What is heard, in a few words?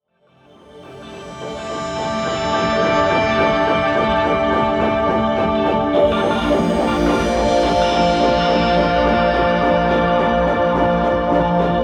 parallel drops